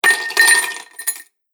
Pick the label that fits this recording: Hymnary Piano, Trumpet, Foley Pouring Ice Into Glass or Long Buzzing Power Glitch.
Foley Pouring Ice Into Glass